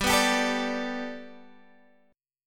G Major Add 9th Guitar Standard, chord; 15,14,17,14,15,x; Gadd9 strummed

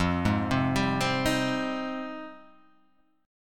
F Minor 9th F

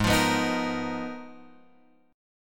Ab Minor 6th Add 9th, Guitar, Open F; strummed; x,3,5,6,6,5

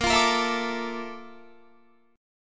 Bb Major Sharp 11th Bb down Guitar, chord; strummed; JGuitar

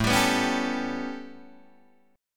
A 9th A, Guitar Open D

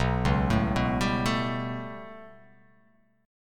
B 7th Suspended 2nd Sharp 5th down, chord; B7sus2#5 arpeggiated; JGuitar

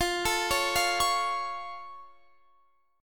Db Augmented F, chord

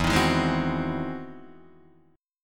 E Augmented Major 9th, Guitar, Open D

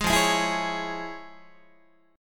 F Minor Major 7th Sharp 5th, Guitar; FmM7#5 strummed; 11,13,11,14,12,12; 4A,4E,5A,5D,5G#,6C#

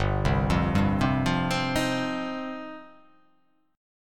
G 7th Suspended 4th Sharp 5th

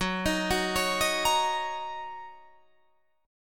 Gb 6th, Guitar Drop D

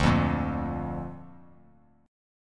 D Minor Sharp 5th D down; Dm#5 strummed; JGuitar

